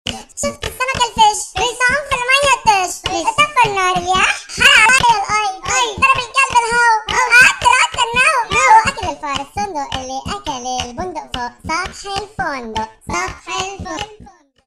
Baby Funny Mix

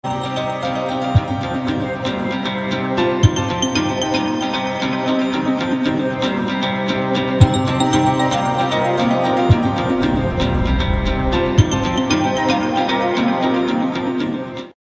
3d H T C Tone

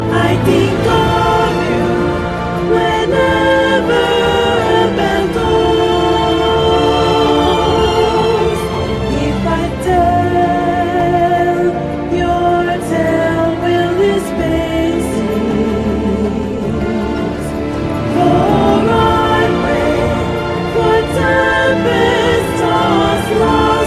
African Song

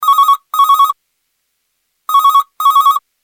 Phone Ringing

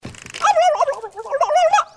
Cuckoo Clock

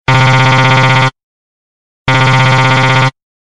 Blarp

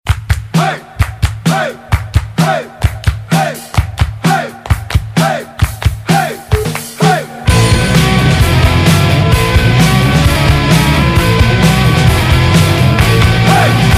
punk

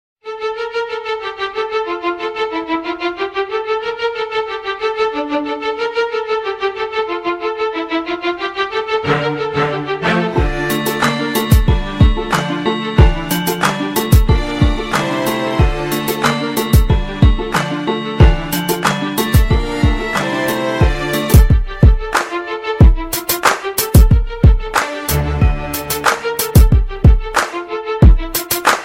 Violin Hip Hop

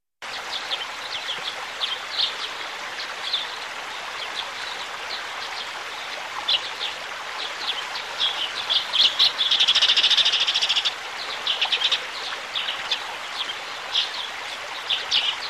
Forests Stream

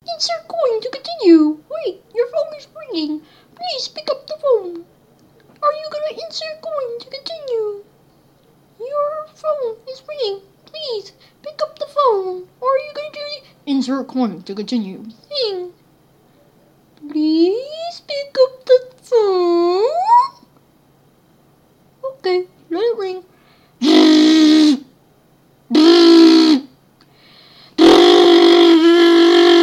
ARCADE VOICE RINGTON

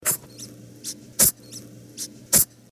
Bunny sneeze

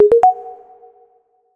Message

